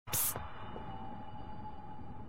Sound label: screech